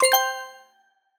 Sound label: Cyan Ping, notifications; Wiko; Cing Peax